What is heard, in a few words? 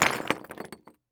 rock drop roll